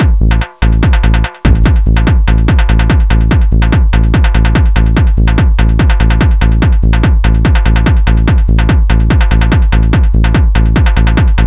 Airwave

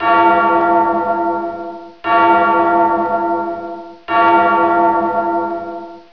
bells; castle

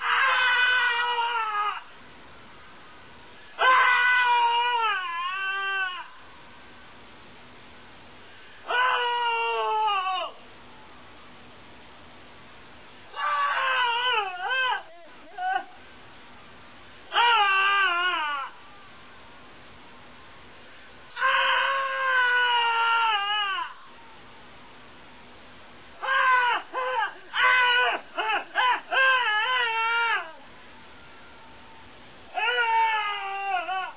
scream

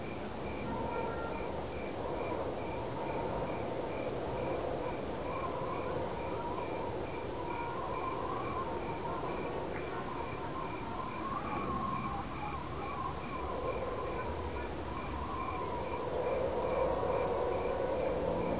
ambience city; awp xmas streets

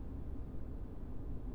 lower tunnels; de dust2

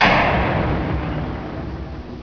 elevator

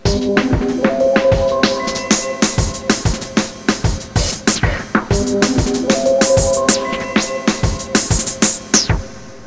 lucid bass

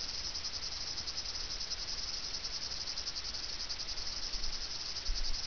crickets

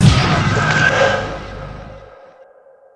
scream; mystic radar

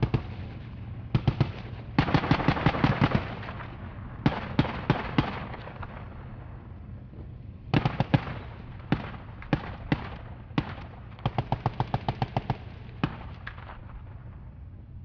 damage guns loop

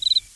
cricket; cs gordian

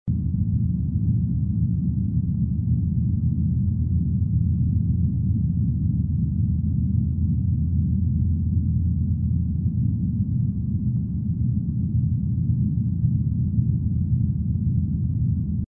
interior; docks

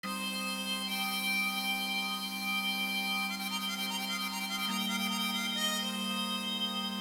west

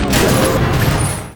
propulsion spin up, weapons; deluge

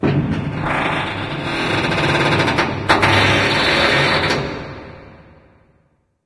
script metal; secret garden

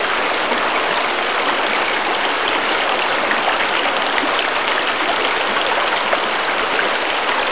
waterfall

